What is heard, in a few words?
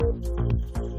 news countdown single beep; bbc